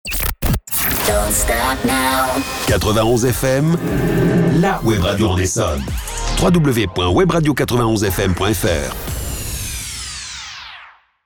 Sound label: Sweep ID; 91 FM; Essonne